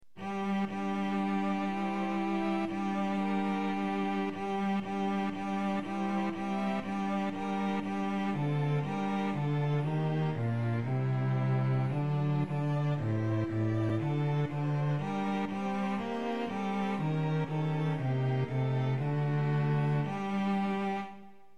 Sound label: SATB